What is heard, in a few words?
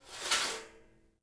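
metal slide small; objects; Star Wars Obi-Wan; XBOX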